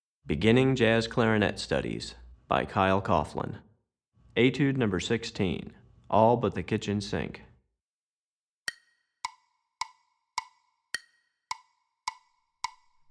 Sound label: Etude, Jazz Clarinet; Etude #16; Recordings For Beginning Jazz Clarinet Studies, Volume 2; Moving Syncopation; Kyle Coughlin